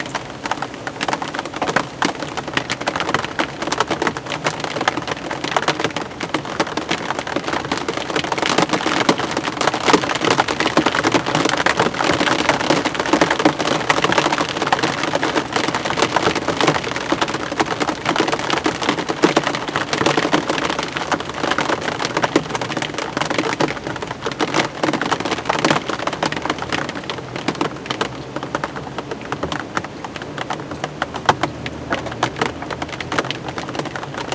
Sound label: Gentle Rain Drops